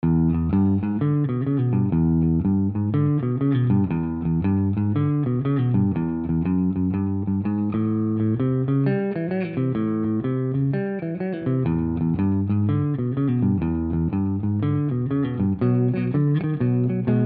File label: Tape Deck